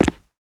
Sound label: rubber, player footsteps